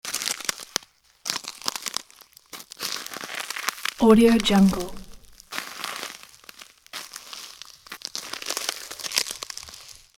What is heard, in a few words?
slow footsteps on ice; sound-ideas